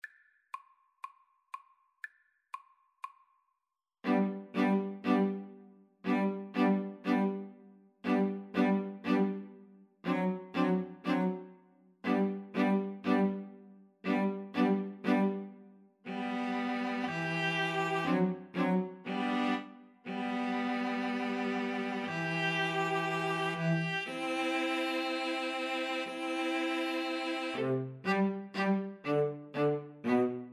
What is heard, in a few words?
turkey in the straw, String trio